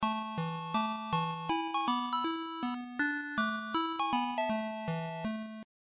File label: Game Over